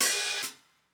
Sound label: open hh; eddyflux